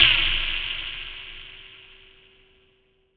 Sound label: fx verbscape, Noise